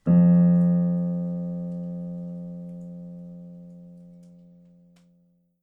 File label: piano key; 24 piano keys